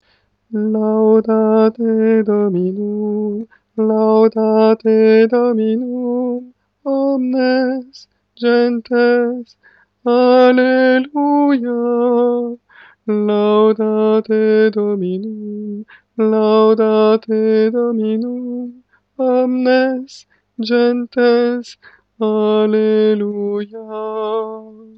Laudate Dominum soprane

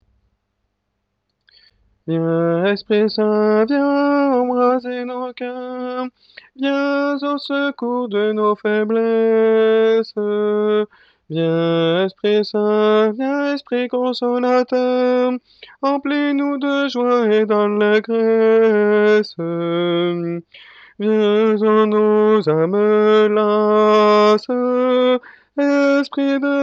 Viens embraser nos coeurs tenor

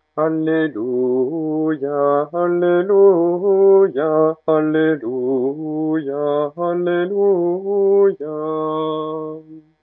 Alleluia alto; mariage

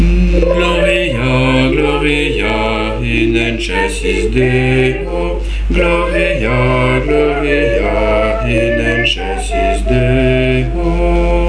gloria basse; mariage

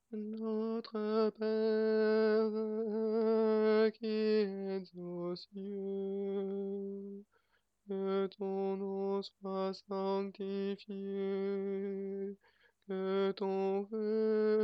Notre pere soprane; mariage; Rimski-Korsakov

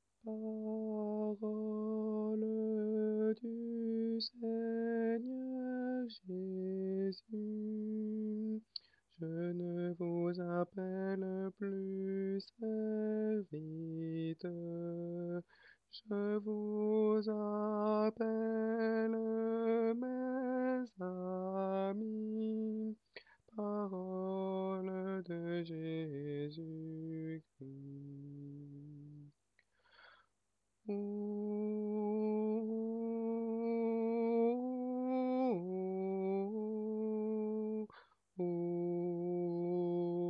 Parole du Seigneur Jésus soprane

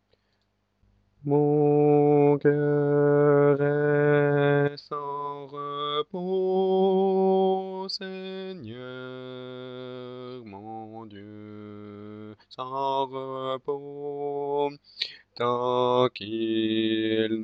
Plus près de toi basse

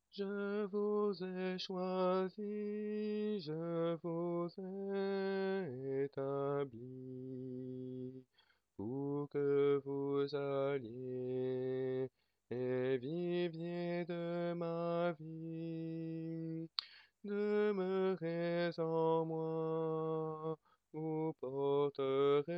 Je vous ai choisis alto

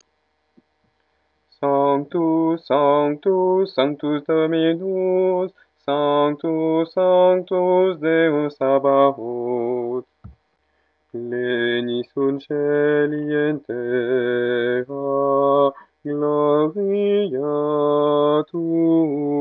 Sanctus alto; mariage

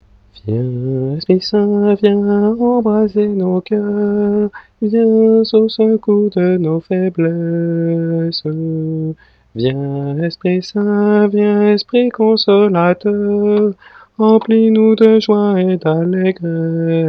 Viens embraser nos coeurs soprane

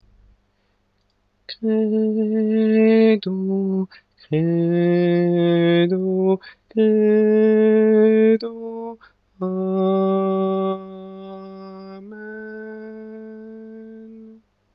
credo soprane; Lourdes; mariage

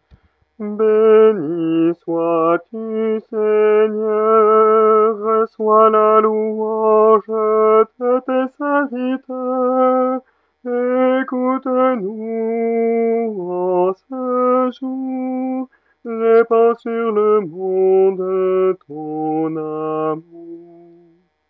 Bénis sois tu Seigneur ténor; mariage

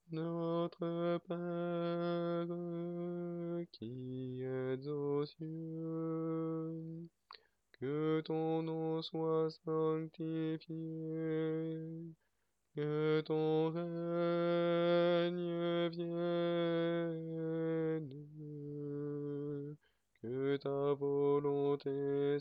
Notre pere alto; mariage; Rimski-Korsakov

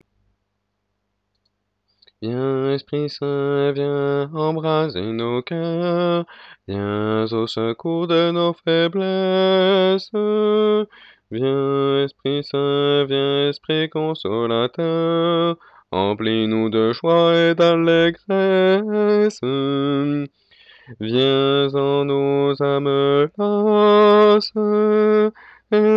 Viens embraser nos coeurs basse

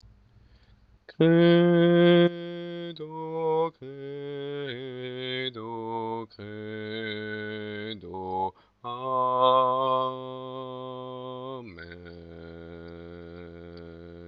credo basse; Lourdes; mariage

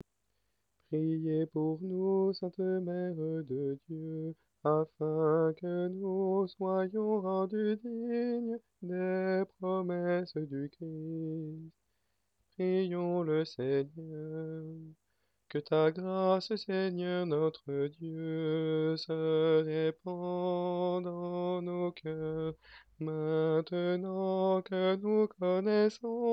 Angelus oraison soprane

